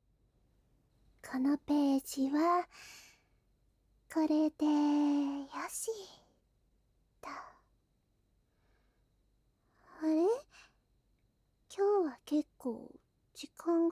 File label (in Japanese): 耳かき; 家庭教師のお姉ちゃんがとろとろに甘やかしてあげる♪